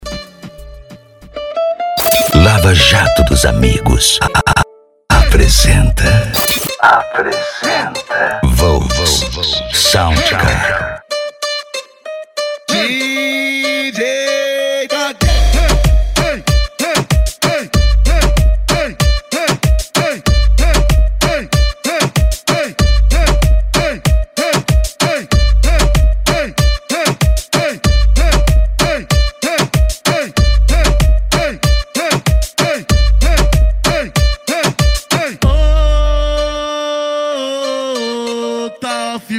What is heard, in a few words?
sound car reggaeton; cd volks sound car